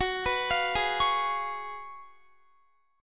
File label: Gb Major 7th Suspended 2nd Suspended 4th, Guitar